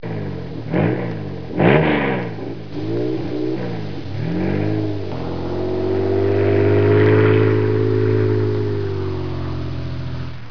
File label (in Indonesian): suara mobil